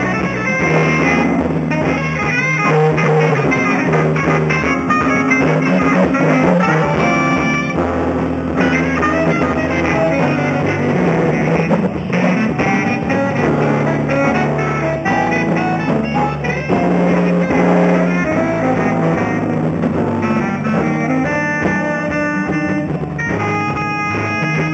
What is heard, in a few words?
jazzy Thames festival